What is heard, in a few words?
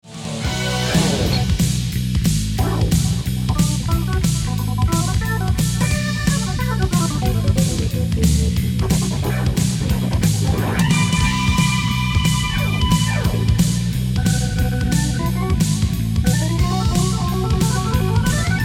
Organ